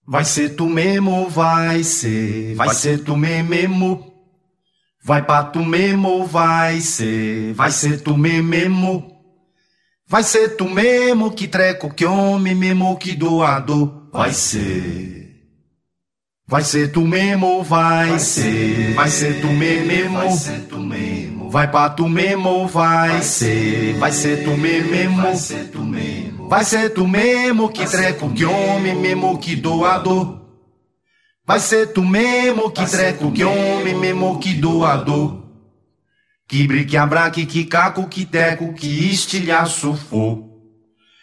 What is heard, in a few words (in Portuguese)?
vaisse naipe baritono